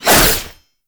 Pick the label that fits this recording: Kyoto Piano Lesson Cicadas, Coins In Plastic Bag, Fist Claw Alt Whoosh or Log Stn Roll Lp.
Fist Claw Alt Whoosh